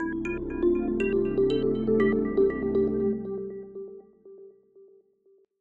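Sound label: arbitrary synthesizer sound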